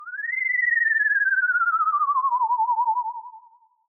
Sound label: tone; Halloween; seasonal